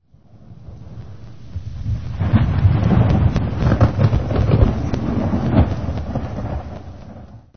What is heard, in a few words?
thunder